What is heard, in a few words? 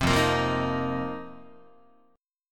F 7th Suspended 4th F down Guitar; strummed